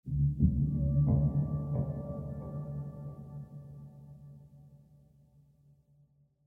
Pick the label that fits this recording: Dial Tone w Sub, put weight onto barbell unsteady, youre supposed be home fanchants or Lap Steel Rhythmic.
Lap Steel Rhythmic